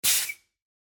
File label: Compressed Air Shut Off Whistle; noise creations